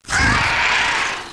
die, hunter; hunter2

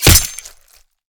hit, knife; cof; guns

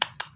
click

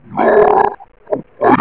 pain; custom monsters; necroscasm

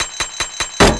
tick

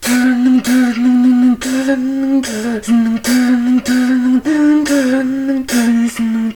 fight

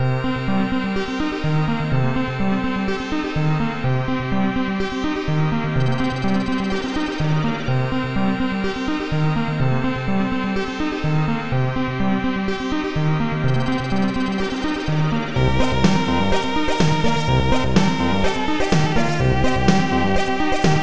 keygen song; sven

